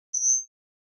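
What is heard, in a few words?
Flash Beep Electronic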